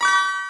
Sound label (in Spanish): magia; alquimistas